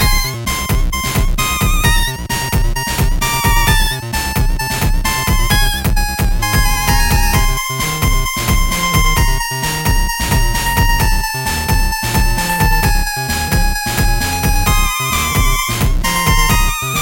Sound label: Battle Song